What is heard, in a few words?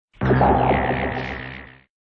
Gundam Zaku Noise